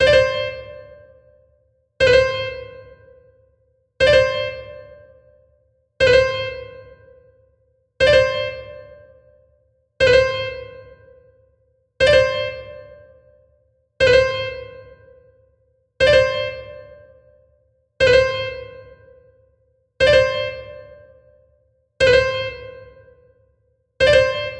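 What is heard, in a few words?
short mordent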